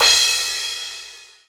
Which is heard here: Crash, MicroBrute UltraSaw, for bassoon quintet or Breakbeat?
Crash